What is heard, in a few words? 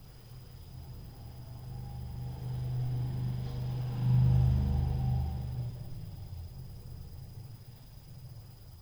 CAR STREET NOISE, Night Noise